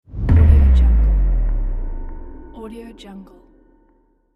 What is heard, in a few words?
out of time impact